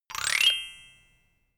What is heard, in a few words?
notification glockenspiel slide up